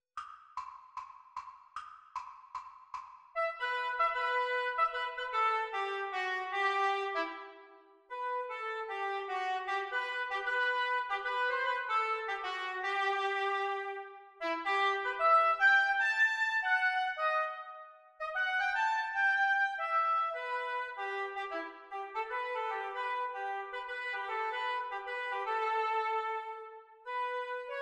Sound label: Oboe Duets